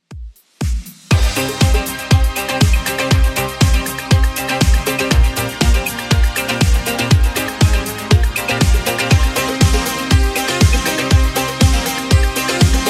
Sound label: 80s Redrum 120bpm